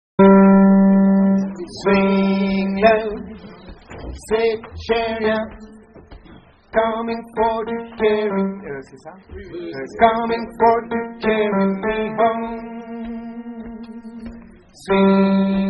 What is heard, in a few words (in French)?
hommes; Swing Low